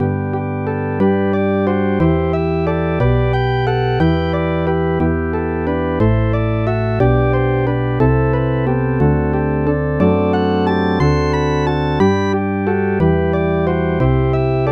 synth bass

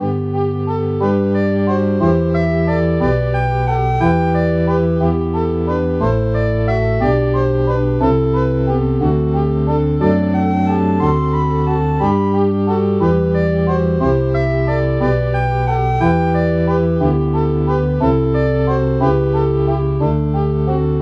recorder

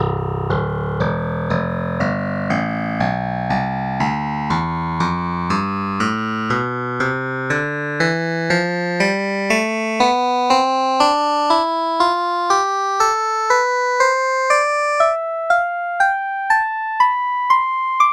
clavinet tonleiter; SpectMorph Evaluation #4

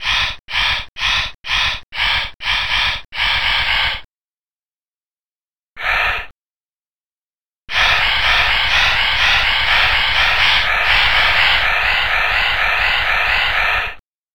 breath noise

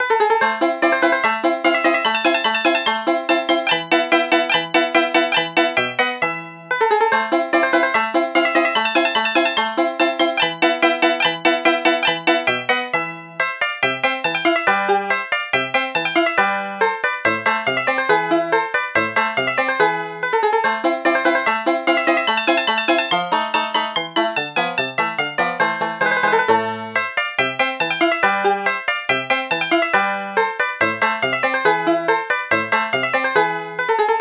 banjo

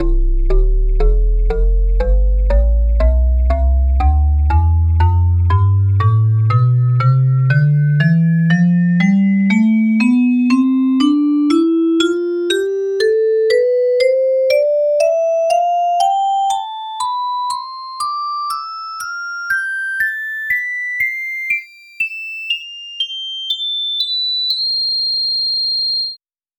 vibraphone tonleiter

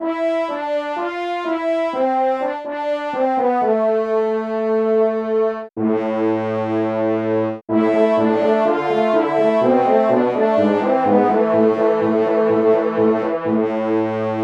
french horns

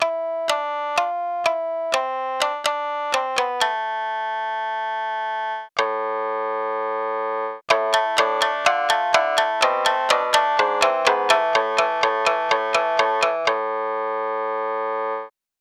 shamisen

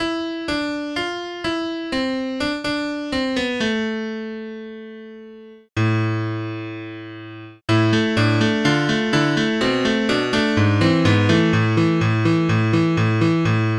yamaha grand piano; SpectMorph Evaluation #4

